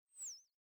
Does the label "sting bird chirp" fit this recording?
Yes